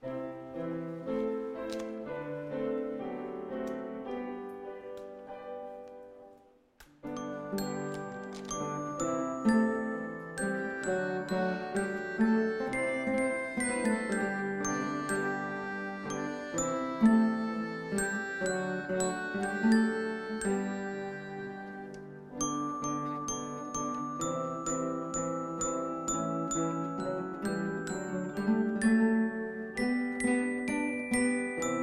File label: Instrumental